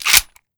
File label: mag in, weapons